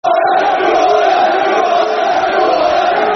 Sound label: Football Chant (Soccer Songs); Who Are Ya; Chesterfield Cfc